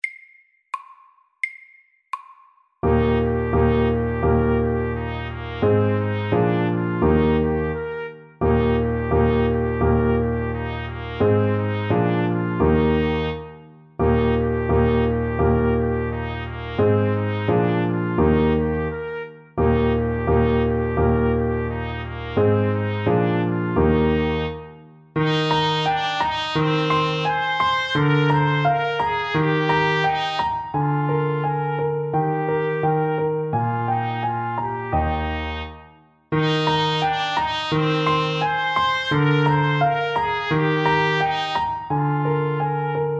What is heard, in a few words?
Ecossaise, 86